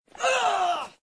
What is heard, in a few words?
grunt